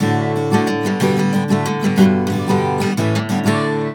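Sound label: guitar, 120, Celtic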